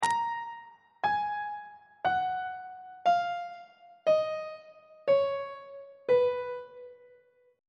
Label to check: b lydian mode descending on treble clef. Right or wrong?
Right